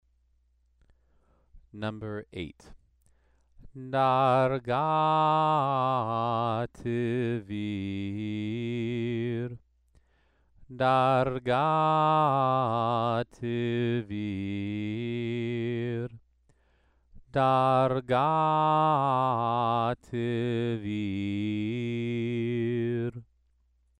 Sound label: Torah trope exercise